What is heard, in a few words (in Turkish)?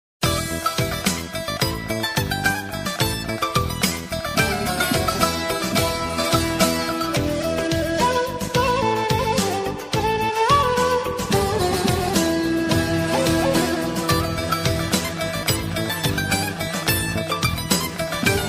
Telefon Zil Sesi